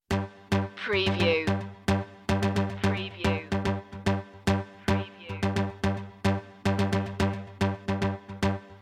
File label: corporate machine loop intro; media music now